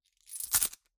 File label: Coins Drop Into Purse